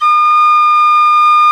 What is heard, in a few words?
P WHISTLE, Old World Instruments, Winds; E-MU Producer Series Vol. 7 – Old World Instruments